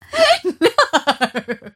mirana laugh; dota

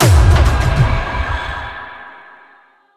unleash cast, weapons; dota; marci

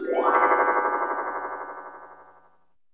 mirror cast; ringmaster

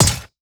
metal blade drop, inventory; ui

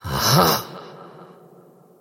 wolf attack emote; dota; crystalmaiden

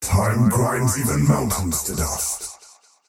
death; dota; faceless void

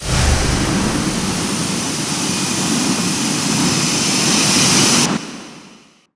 illuminate charge; weapons; dota; keeper

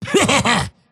axe laugh; dota

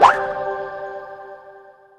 cookie cast; weapons; dota; snapfire

